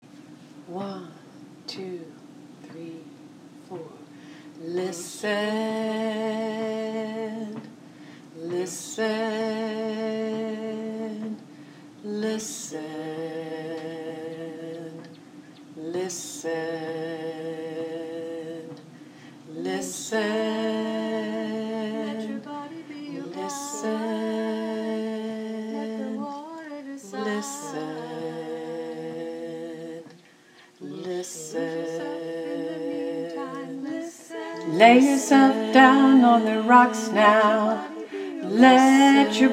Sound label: tenor